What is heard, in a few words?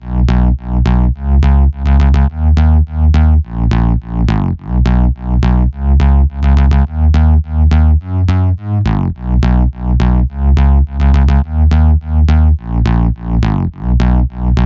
105 BPM E Bass Loop